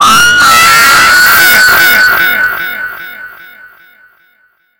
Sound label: jumpscare sound; fnati classic mode